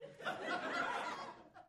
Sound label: Crowd Short Burst, small int